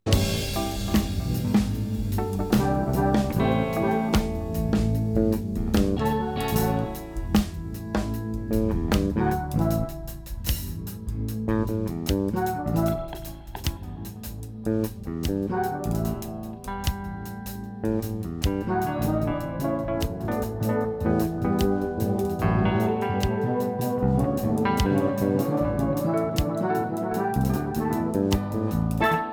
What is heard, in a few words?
Reggae; Reggae Help #2